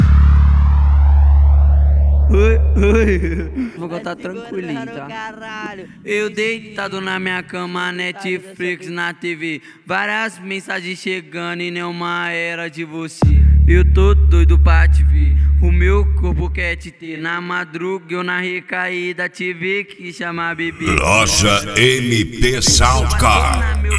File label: sound car; mt sound car